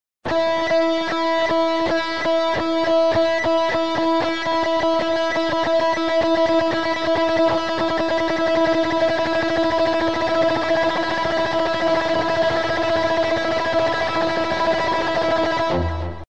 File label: tremolo